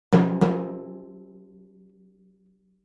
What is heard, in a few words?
Timpani Hands Speedy; mallets timpani